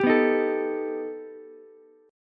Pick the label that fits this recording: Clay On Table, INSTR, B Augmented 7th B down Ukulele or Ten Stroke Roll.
B Augmented 7th B down Ukulele